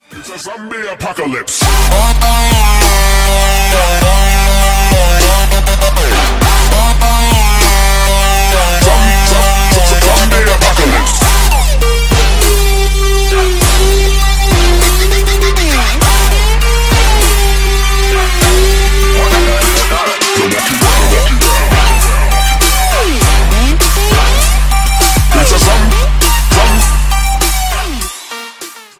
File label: Halloween Remix Song